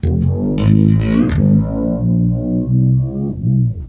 REV, Guitar

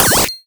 Cwejman S1; 300 Drum Machines; DevSnd

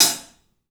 HAT, CLUBHAT, 3D Drums; 3D Sound

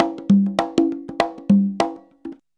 CONGA, Modern Percussion Loops

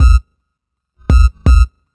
Subtractive Beat

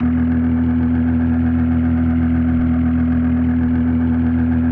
E1F, B3, Vintage Keyboards

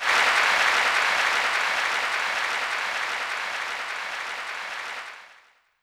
Applause; YAMAHA RM1X

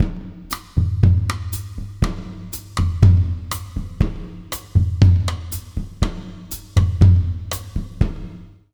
120 W side, jazz latin drumloops

